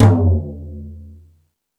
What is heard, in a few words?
Thundadome, E-MU Pro-Cussion